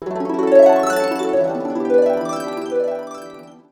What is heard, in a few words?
harp run